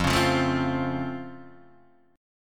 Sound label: C sharp Augmented Major 7th F